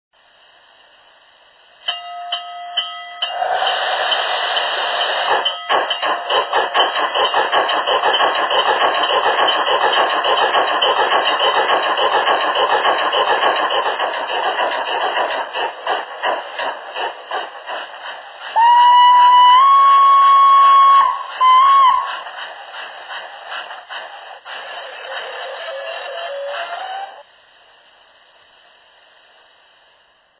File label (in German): loksound